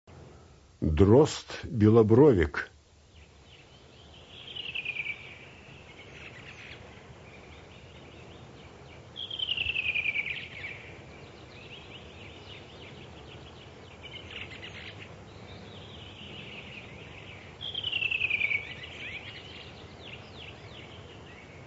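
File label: redwing voice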